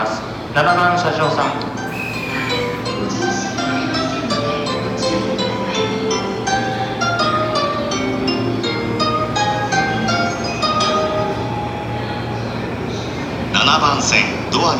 JR 常磐線 東京 北, train sounds

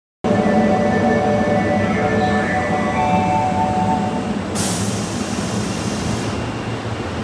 大阪メトロ谷町線発車音

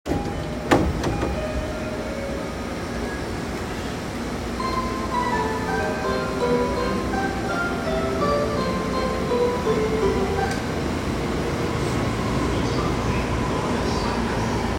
JR 東海道線 鴨宮 北, train sounds

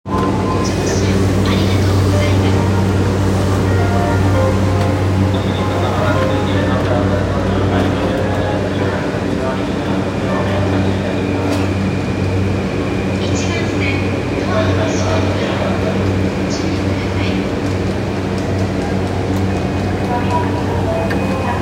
JR山手線目黒CCW

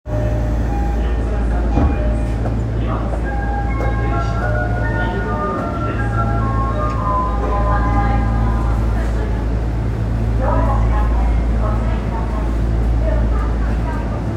丸ノ内線四谷三丁目東, train sounds

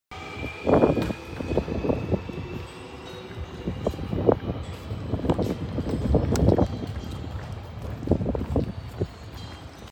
南海本線岸里玉出に西, train sounds

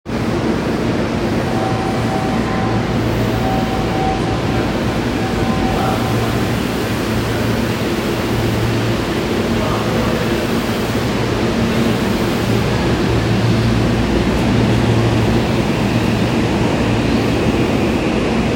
西武新宿線所沢東

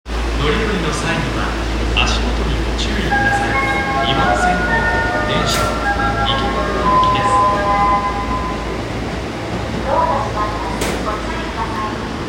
丸ノ内線四ツ谷東, train sounds

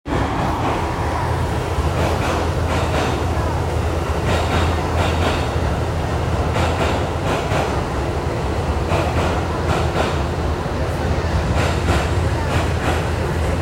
東西線葛西西, train sounds

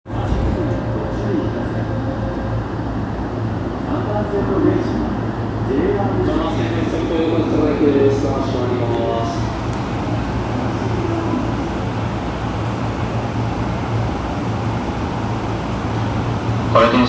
JR中央・総武線中野西

